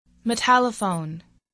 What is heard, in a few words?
metallophone